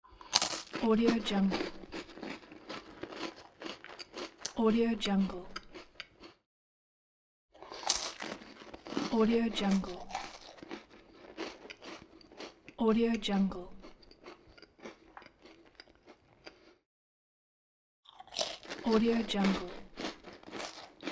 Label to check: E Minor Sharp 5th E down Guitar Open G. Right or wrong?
Wrong